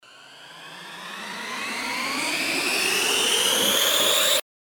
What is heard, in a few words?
RISER